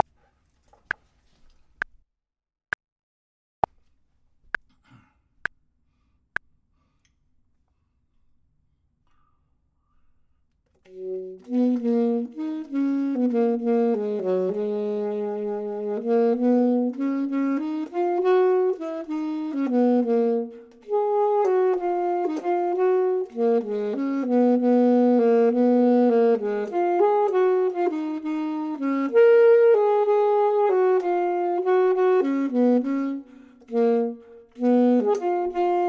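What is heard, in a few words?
bottom, Saxophone and Clarinet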